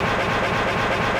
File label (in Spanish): MOTOR ARRANQUE; SIMUZENSOUND